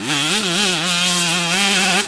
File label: high, Engine